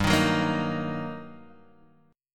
G Minor Major 7th Sharp 5th, GmM7#5 chord; strummed; x,x,x,0,3,1,0,0; JGuitar

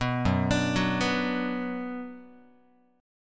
F sharp Suspended 4th Sharp 5th Guitar, chord; arpeggiated; F#sus4#5

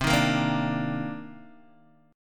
C Minor 9th C Guitar DADGAD

